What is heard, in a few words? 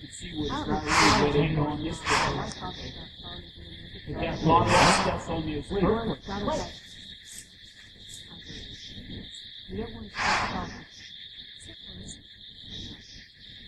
growl sounds aplified and noise reduction